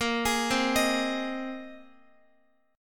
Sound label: A sharp 7th Suspended 2nd Suspended 4th, Guitar; A#7sus2sus4; arpeggiated; x,x,10,13,12,11